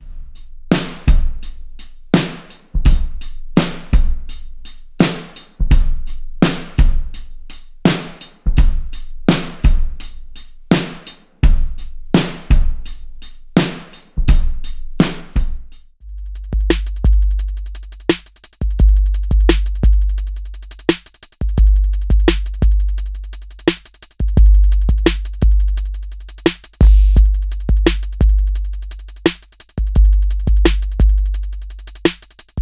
hip hop; addictive drums 2 hip hop and gospel collection

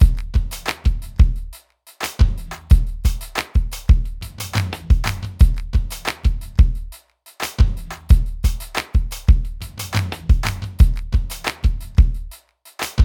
Jazz

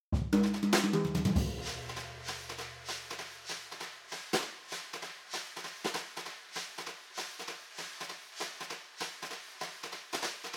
sweeps; xln audio

